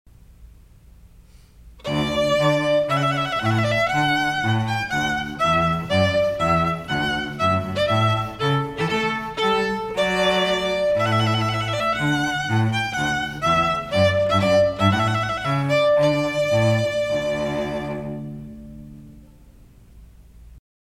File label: Duo Trumpet Voluntary